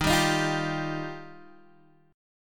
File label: Eb Major Add 9th, Guitar Open G